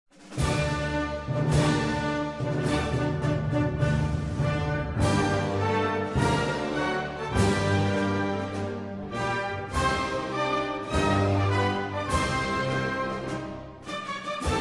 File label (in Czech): Turecka narodni hymna instrumentalni